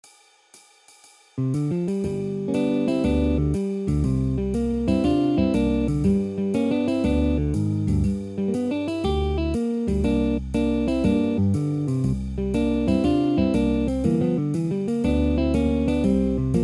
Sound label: double stop song